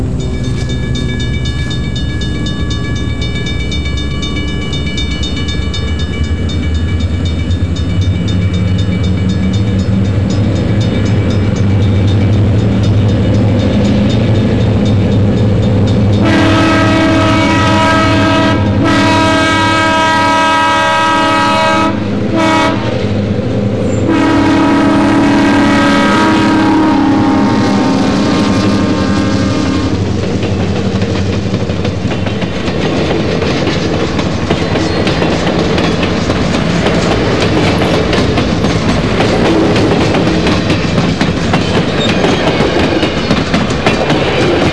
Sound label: pass train